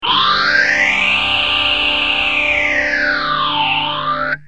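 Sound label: guitar tc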